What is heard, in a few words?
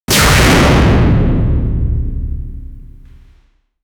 crackle energy mat pulse